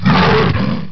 zombie alert; blackhawk

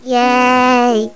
"yay"

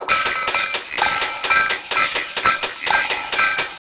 perc loop low; cymatics

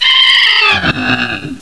die

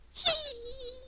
miss

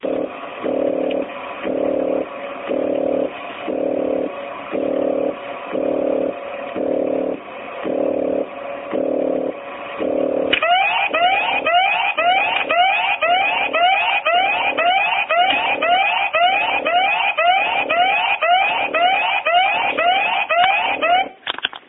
alarm

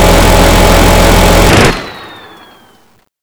gun; DarkCity

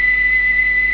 laser

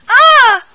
die; sven; npcs

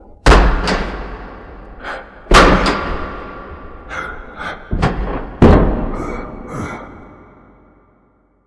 scream

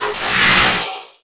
gate open

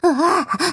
gonome pain

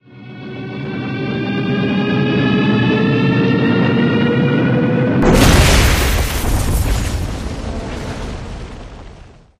ship crash; reddeath

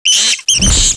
bat att; eqclassic

